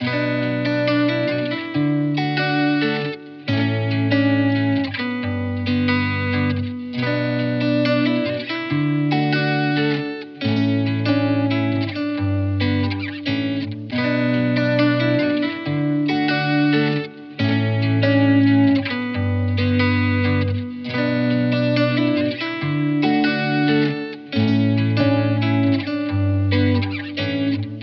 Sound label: 138 BPM B Min, Miami Guitar; Sun; Cymatics